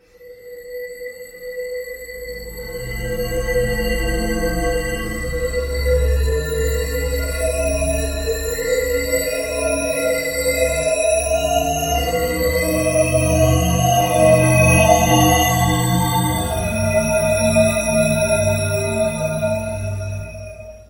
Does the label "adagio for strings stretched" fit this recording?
Yes